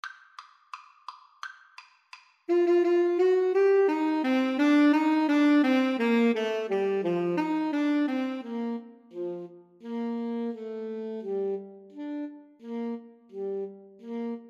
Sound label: anchors aweigh 2 A SAX